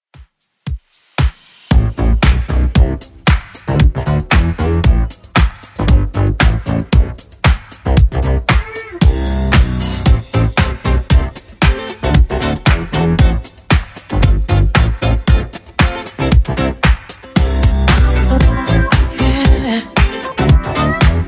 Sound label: 80s, 115 bpm; Redrum